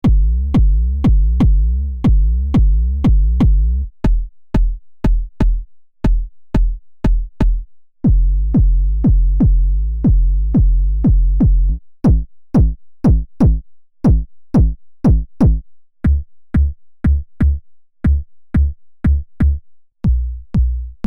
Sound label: Jomox ModBase 09 MK2 Bass Drum Presets